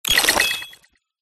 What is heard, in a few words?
Micro Mechanics Tonal; PMSFX